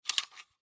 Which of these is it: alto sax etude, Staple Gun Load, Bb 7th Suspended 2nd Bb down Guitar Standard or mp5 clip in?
Staple Gun Load